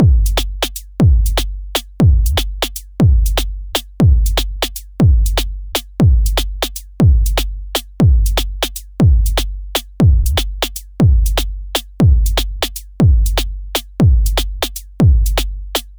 delay manglers tonic dry; aas; objeq delay

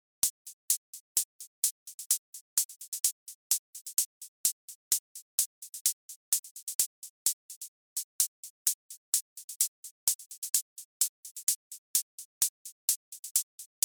Hihat

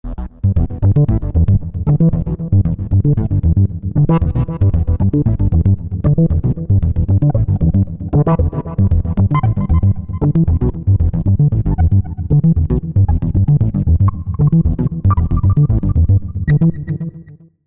Bass

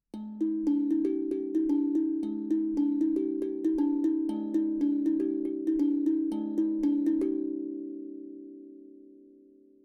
hapi Dual Mono close

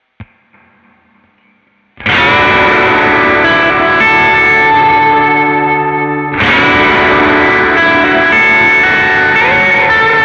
light pedal

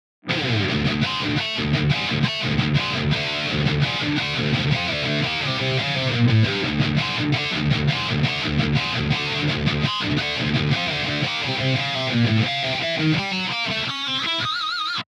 Metal Distortion

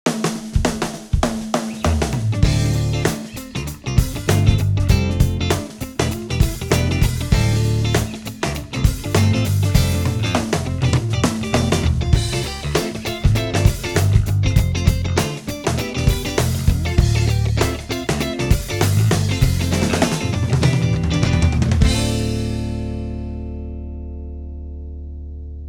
Neve 1073DPX High Input Gain; Neve-Sample

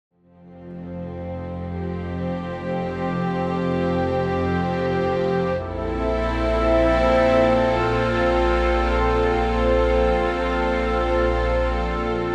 Orchestra Session

